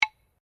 Clap sticks (bilma) medium single, Traditional Australian Aborigine rhythm sticks; Musical; Miscellaneous Musical